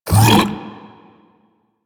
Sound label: regurgitate cast; DE; Warframe; Warframes; Grendel